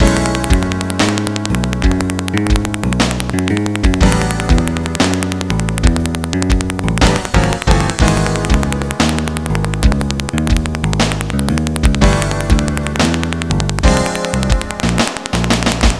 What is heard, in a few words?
Bridge, Jazz; Sound Factory